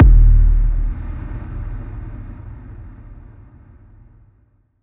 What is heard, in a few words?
drum hit finish